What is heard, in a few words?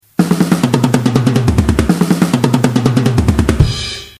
Drums